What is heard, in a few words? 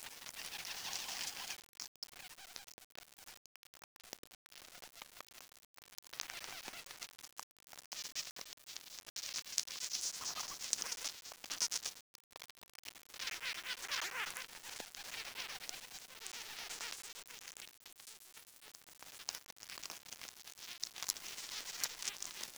ant eating